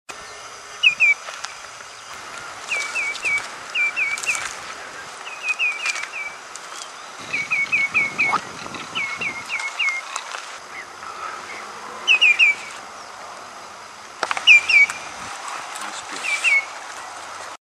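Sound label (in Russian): ulit bolshojj, great curlew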